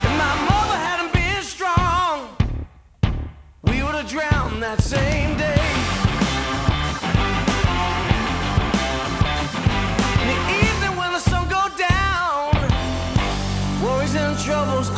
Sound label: lens rock dry